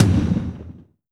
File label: T-TOM, PERC